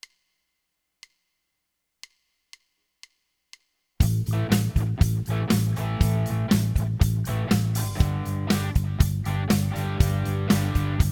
Rock Solo

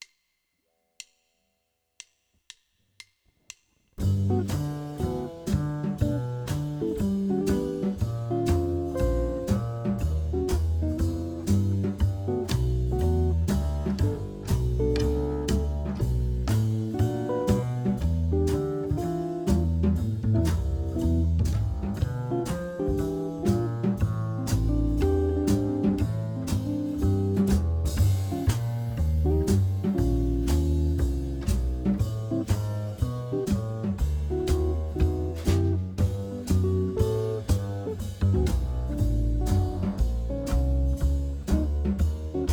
Jazz Solo Backing Track

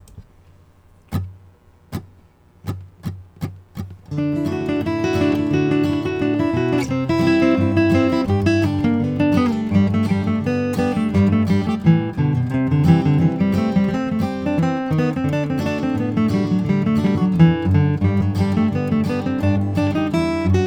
Blue Grass Solo Backing Track; Blue Grass Solo 2 Example

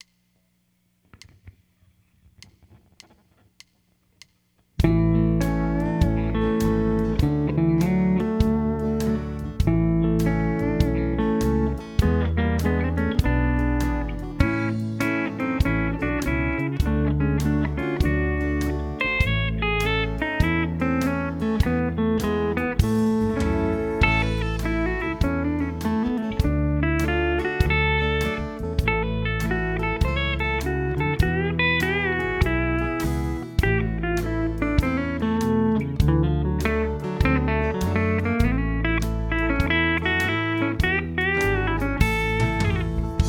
Country Solo Examples, guitar

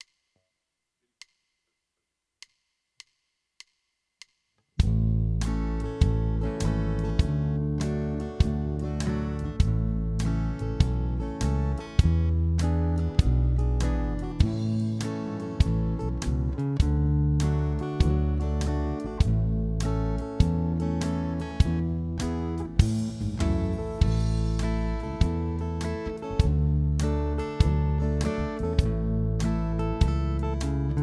Country Solo Backing Track

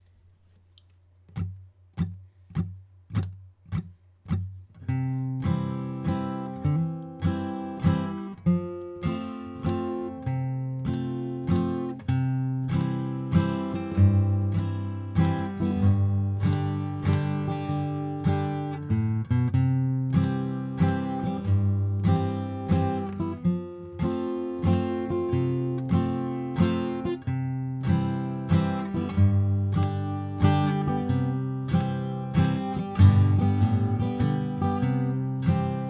Bluegrass Solo Backing Track

